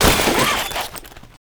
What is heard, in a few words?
Military Backpack Destroy; Objects; Storage Container; Warframe